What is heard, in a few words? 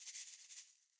shaker Rolls; Raw Perc One Shots; Slate; SD SEISMIC Drum Sample Pack